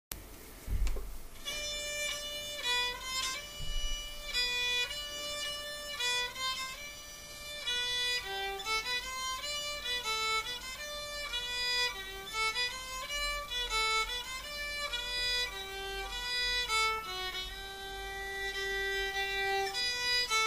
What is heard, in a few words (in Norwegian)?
førstestemme; Kjerringa med staven